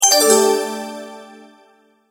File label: Multimedia Tone-Return/Back